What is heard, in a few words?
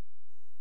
C#, Synclav FM, FX; Big Fish Audio